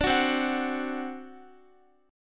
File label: C Suspended 2nd Flat 5th C Guitar; strummed; Csus2b5; x,x,14,11,12,14; 4F,4A,5C,5G,5C,6E